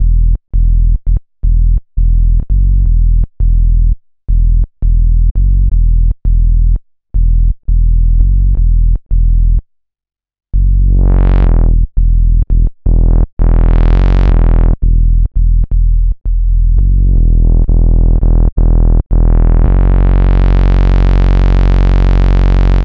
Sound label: Low J Bass, Drum n Bass; KJ Sawka; Drum n Bass & Beyond